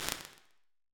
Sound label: Slap Chamber, Impulse Responses, Small Spaces, Rooms; Apple